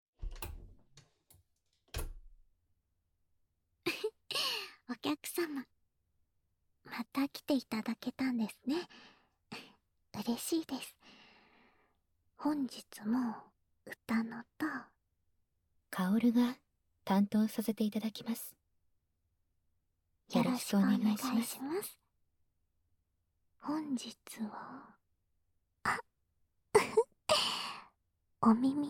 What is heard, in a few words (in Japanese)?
両耳舐めマッサージ, ASMR